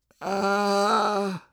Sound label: moan, male; Half-Life 2